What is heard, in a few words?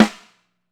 DEAD TUNE, snares; Sampleheads - New York City Drumworks VOL-1